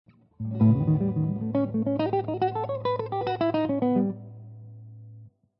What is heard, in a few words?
Lydian Dominant Lines